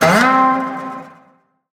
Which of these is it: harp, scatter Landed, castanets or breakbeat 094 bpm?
scatter Landed